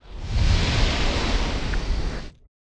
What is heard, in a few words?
Flamethrower; SoundsCrate